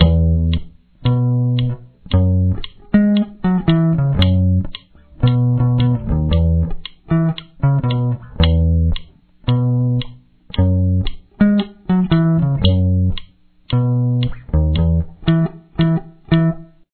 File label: verse bass